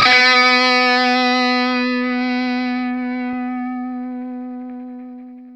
SINGLE B 3, Vintage Blues Guitar, ELEC GTR; USB Soundscan vol.22

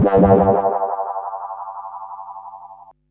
bass delay fx; Drum&Bass; Instrument Samples; EZRollers

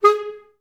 STAC G#3, Orchestra; Best Service; Peter Siedlaczek's Advanced Orchestra

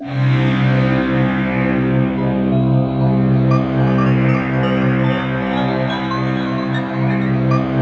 SYN JD800; Best Service Gigapack 1

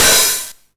909 OHH Tape Sat; Goldbaby Tape808 & Tape909; The Tape 909

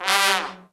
SECT BRAS FALL

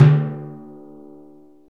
PRC TIMPAN, Timpani Dry

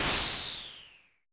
zap, Roland MC-909

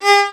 VIOL G#3, VIOLON, Arabian Traditions; USB Soundscan vol.43; AKAI

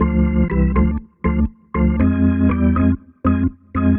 Jazz Keys Organ 120 bpm Riff D; B-line n Riff

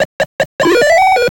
FX, attacks

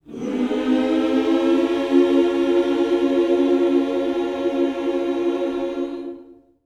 MINOR, MIN.SCOOPS, Vocal Planet, Gospel; Vocal Planet Vol1 Gospel

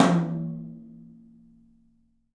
TOM, Studio Toms; 3D Percussives

